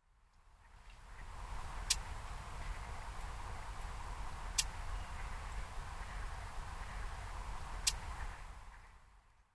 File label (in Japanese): yabuyoshikiri, imba